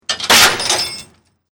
Chain Metal Clanging

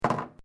China cup placed table; Set Downs; Objects

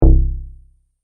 Tired Stop, Beep; Beep Sound Effects

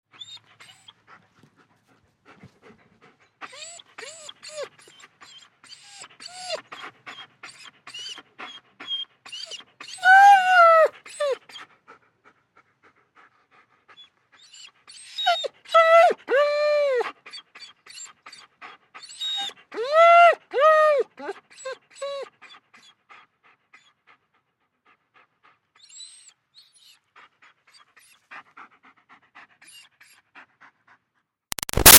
Dog German Shepherd Pants Whines; Animals; BigRoomSound

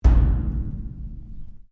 Strike Metal Hollow Tank; Materials

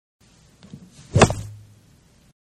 Golf-Drive-Off Tee Hitting Ball; Sports; Manhattan Production Music

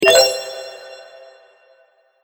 Magic Menu Click

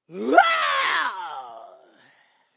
Vocal Funk Yell 110, Funk vocal loop sample; 110bpm

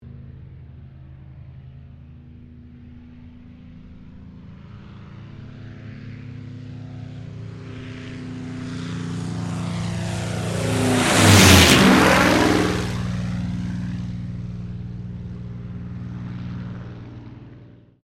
AIRCRAFT SUPERMARINE SPITFIRE MK-VIII TAKEOFF; Airplanes (Sound Effects:Vehicles)

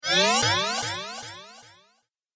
Frothy Laser Pulse Transition; Whoosh Sound Effects

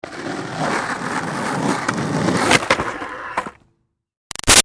Skateboard Up Curb Hit Crash; Roll Up; Skateboarding; Sound Effects:Sports; Big Room Sound

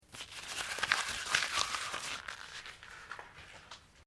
PAPER SHEET SCREW UP; Material components for constructing more complex sounds; Paper (Sound Effects:Materials)

